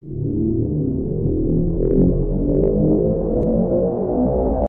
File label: large camera sound